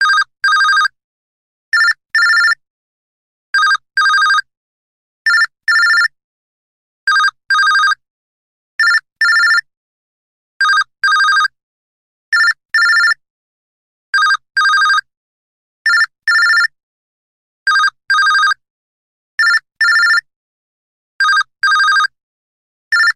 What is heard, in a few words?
Ascending Beep, ringtones; LG SU870 Optimus 3D Cube